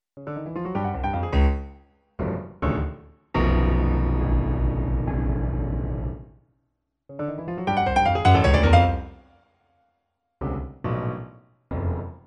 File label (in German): Klaviersonate; Kaiser